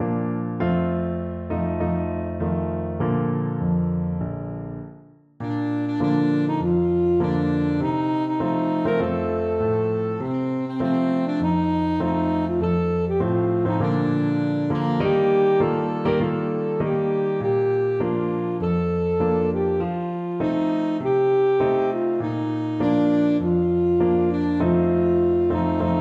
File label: saxophone